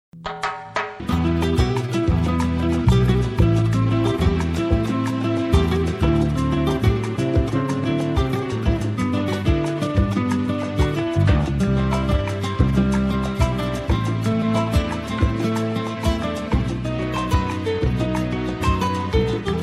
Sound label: Piano